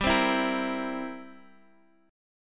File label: G 6th Suspended 4th Sharp 9th; G6sus4#9 strummed